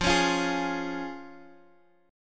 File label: F Minor Sharp 5th, F, Guitar, Open G; x,x,3,6,6,6